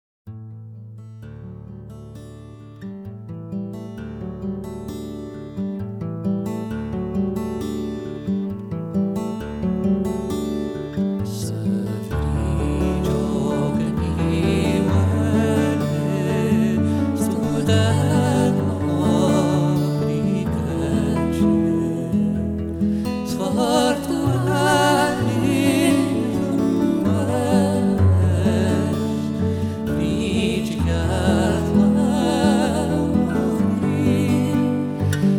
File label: Celtic Christmas Mix